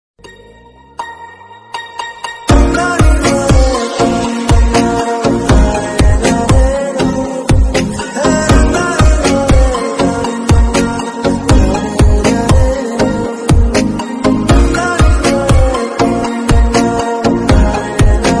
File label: Mother Love BGM; KGF